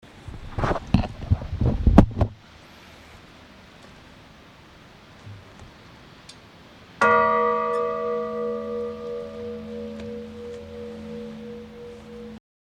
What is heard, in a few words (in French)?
cloche; Pruniers